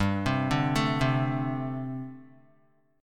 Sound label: G Suspended 2nd Suspended 4th, Guitar; Gsus2sus4 arpeggiated; JGuitar